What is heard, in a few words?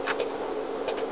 slide; Image Bonanza; Expert Software